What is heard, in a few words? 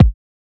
kicks